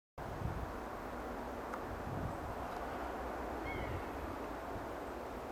tyne valley alarm call

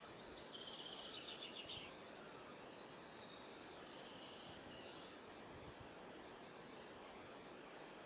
allen wailing calls

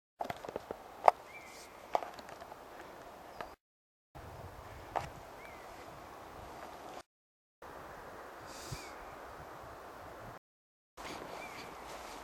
honey-buzzard juv call fly; hexham w

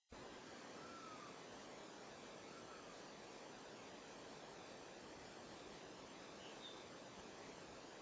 allen wailing calls

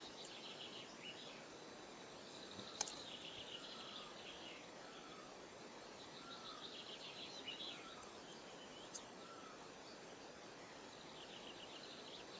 allen wailing calls

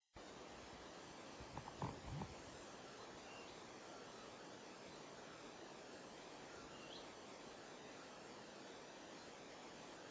allen wailing calls

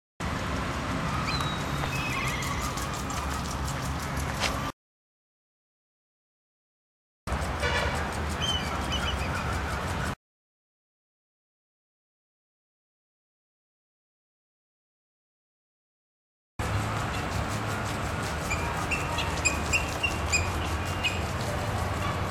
calls long and more staccato; bangalore

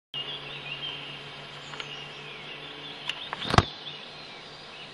south tyne calls

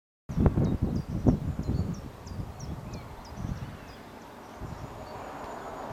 honey-buzzard pair display; ordley

